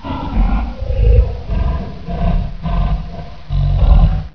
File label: gar idle